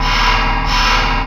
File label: MACHINES